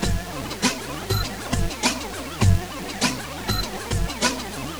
Robo Beat 100BPM, sci-fi Beats

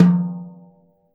TOM, DRM KIT; Jazzistic

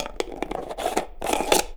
SCREW UP, household; AKAI Anime Sound FX

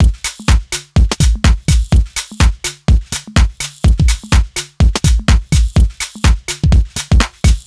Full Loop 125, Loungin House; Equipped Music

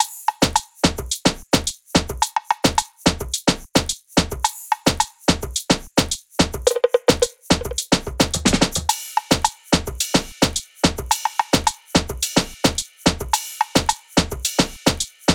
loop top 108, Seismic Loops

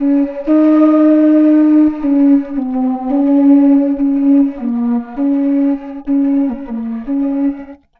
Tropical Flute; Sonic Foundry (Sony Creative Software); Ma Ja Le Ethereal Textures; Flutes

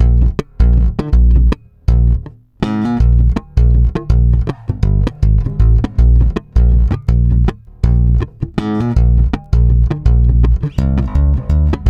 AL STRUM C, bass; Spectrasonics; Bass Legends; 88 STRUMMIN

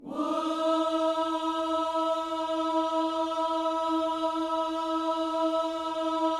WHOO E 4, Gospel vocal; Spectrasonics Vocal Planet

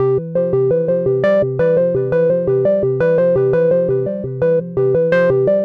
Seq Hapulse, Drum N Bass; Keys; Pads; Sonic Foundry (Sony Creative Software); Knutrix Drum & Bass

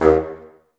Bassoons D#2; Miroslav Vitous - Woodwind Brass Ensemble; Bassoons Links

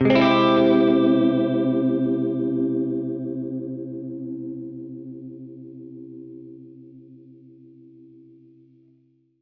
Gretsch Chord Cmin[maj7], Guitar Chords; undercover samples

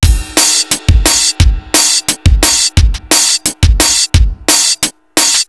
175 compress, breakcore

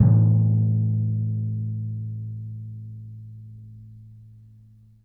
A1 p, Timpani, piano; PowerFX; Downtown Orchestra; scales

